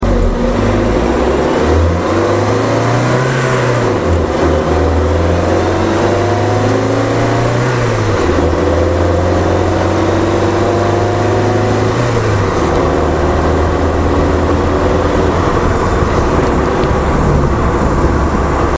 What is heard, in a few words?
Persona Acceleration Outside